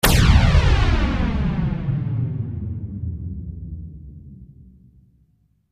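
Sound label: flange blast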